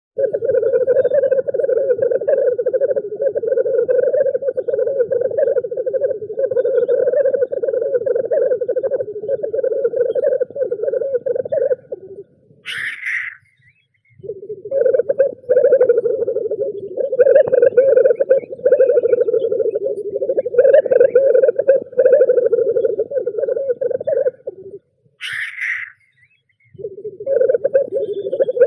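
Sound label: black grouse Tetrao tetrix, nature sounds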